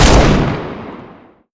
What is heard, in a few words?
fal shoot; tf2classic